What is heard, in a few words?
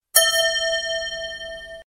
sms bell